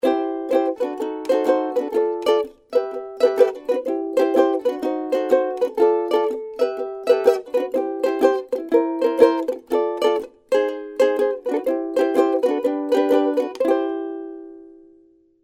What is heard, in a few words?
Uke Shuffle